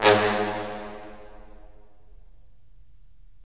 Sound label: BRS, Roland LA Composer